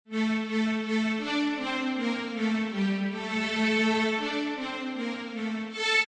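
Loop orchestral strings riff Dm 160, Orchestral strings loop Dm 160 bpm.; String Loops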